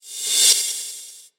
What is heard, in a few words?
reverse